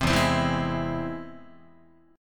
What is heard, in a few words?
E Augmented, Guitar; E+ strummed; JGuitar